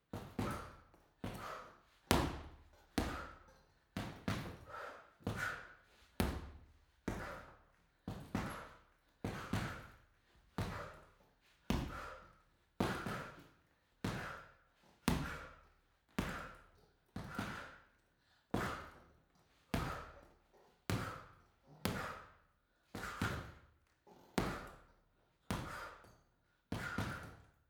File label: boxing punching bag boxing sequence female breath reverberant; boxen